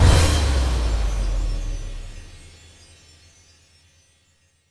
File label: near Win End; fairymirror